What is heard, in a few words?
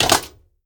On Stone Jump; moul